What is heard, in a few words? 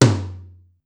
Tom, Korg Wavestation-SR